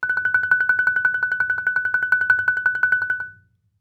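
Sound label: Marimba roll fortissimo Gb6; MIS Pitches - 2014; Percussion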